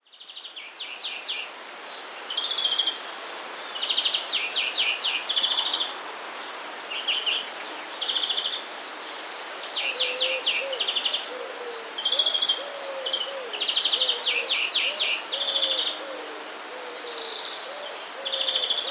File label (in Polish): dzwoniec, prawdziwy dzwoniec; wroblowe; zapozyczenia